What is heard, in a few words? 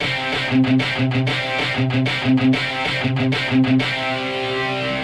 guiter